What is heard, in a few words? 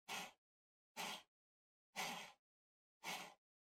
PC Mouse Move Far Long